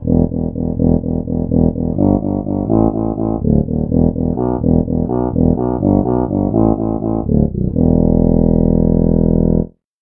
soprano sax bass

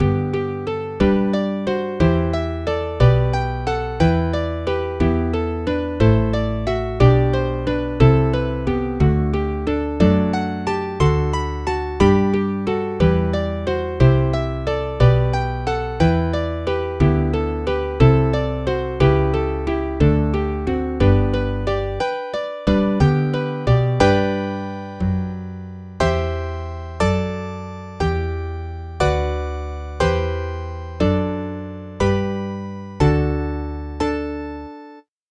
acoustic bass bach

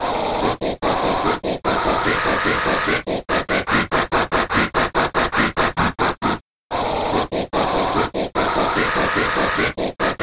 breath noise

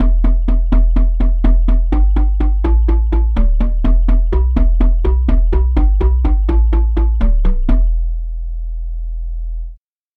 celesta bass; SpectMorph Evaluation #4

